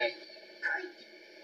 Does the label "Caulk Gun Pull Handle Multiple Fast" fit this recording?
No